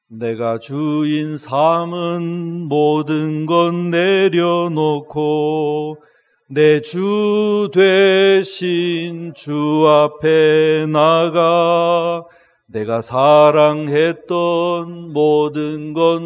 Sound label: choir